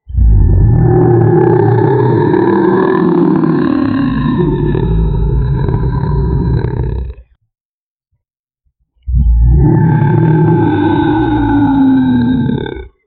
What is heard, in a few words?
the sound of a large, prehistoric